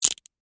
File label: Crack Crunch Single, Texture, Crack, Crunch, Single, Penne, Rigate; Food; BlastWave